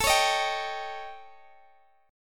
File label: A 7th Flat 5th Guitar Open G; x,x,19,18,16,17